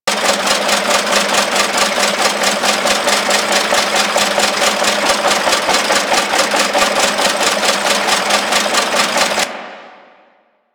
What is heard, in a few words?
Settlement Small Machine Loop